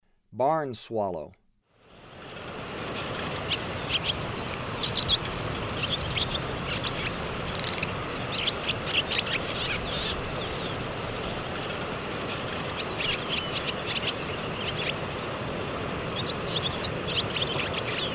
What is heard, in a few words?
Barn Swallow; S. America; Eastern Lowlands